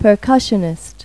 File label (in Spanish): PERCUSIO; Pipo-Aprende musica con Pipo